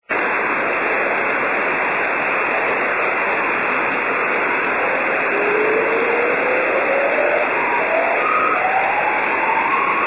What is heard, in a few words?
airplane; 5G jt65c; ドプラー音; 晴、気温7℃ 湿度25%; 飛行機・機体反射：羽田空港上空 WSJT/JT65C 伝播距離：50km; JH1UGF 横浜市; JN1AYV 茅ヶ崎市